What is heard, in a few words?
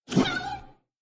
cat